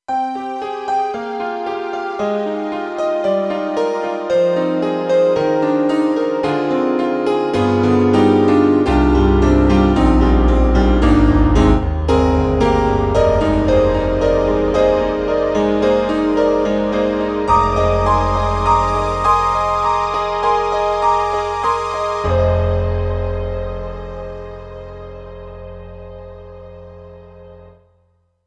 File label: KORG M3 XPanded Layered Piano